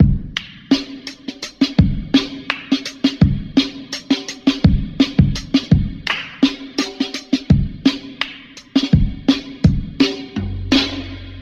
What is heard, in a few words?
sick claps, funky drum loop; Drum loops